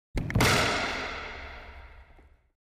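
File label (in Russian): теннис удар ракеткой об стену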